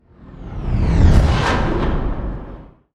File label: Riser Hit